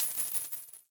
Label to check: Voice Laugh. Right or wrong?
Wrong